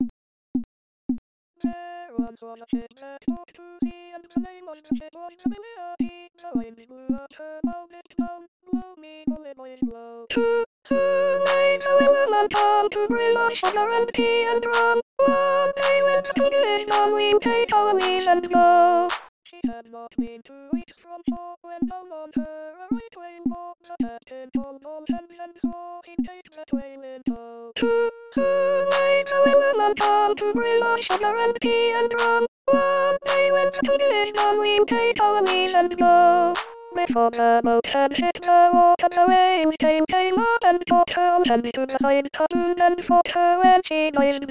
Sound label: soprano